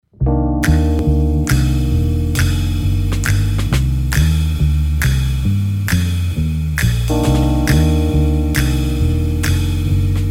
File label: bright sider contemporary